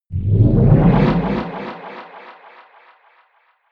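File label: delaying rise, drones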